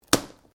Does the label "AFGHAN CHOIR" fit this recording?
No